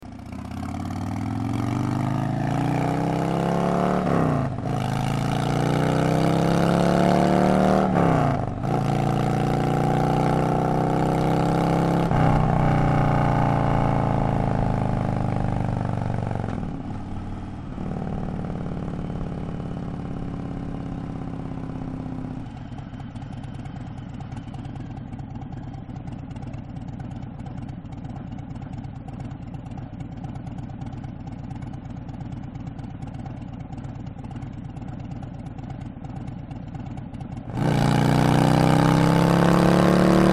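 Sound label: Stainless Upsweep 2 1 M8 Softail, motorcycle exhaust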